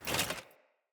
Foley Action Armour Jump; Player; Deora Armour

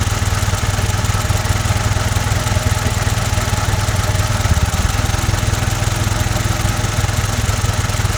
Asteroid Winch Engine Loop; Warframe; Ambience; Grineer Asteroid

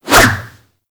Staff Swing; Warframe

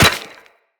Run Bone, Footsteps; Characters; Operator

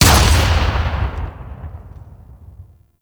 Fortress Shrapnel Explosion; Ambience; Gameplay; Warframe; Grineer

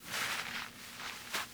Catbrow Foley Idle Roll; Warframe

